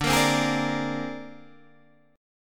C 7th Suspended 2nd Sharp 5th D

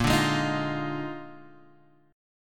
A sharp 11th, Guitar Open F; strummed; JGuitar

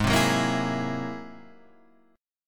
G sharp Augmented 9th G sharp

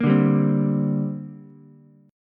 C 7th Suspended 2nd C down Ukulele; C7sus2 strummed

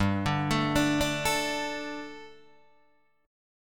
G Suspended 2nd G, down, Guitar, DADGAD

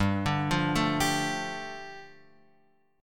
G 7th Suspended 2nd G, Guitar Open F; arpeggiated; JGuitar

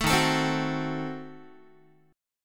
Eb Diminished 7th Eb down Guitar; Ebdim7 strummed; 12,11,12,10,10,x; 4A,4E,5A,5D,5G#,6C#; JGuitar

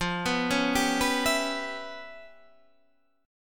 Db 7th Sharp 9th Flat 5th F, chord shape

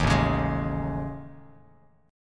A Major 7th Flat 5th, down Bass Standard; AM7b5 strummed; JGuitar

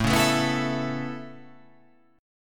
A Major Add 9th A, chord

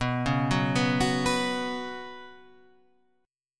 B Suspended 2nd, Guitar, Standard; Bsus2 arpeggiated; 7,4,4,4,7,7; JGuitar

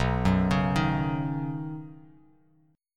B Major Add 9th, Badd9 chord, Bass Standard; arpeggiated; JGuitar

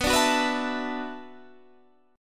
B Minor 11th B down Guitar Standard; Bm11 strummed; JGuitar

